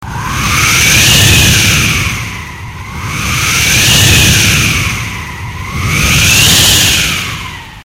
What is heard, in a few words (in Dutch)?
Kermis Booster wind lang